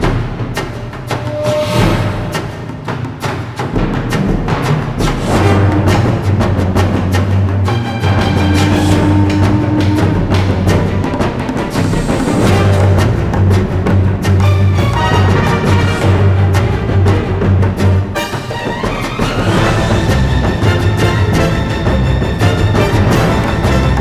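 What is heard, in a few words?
Mid Combat loop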